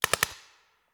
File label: Paintball Gun; Sound Ideas; Guns